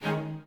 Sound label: emboss; tuxpaint; magic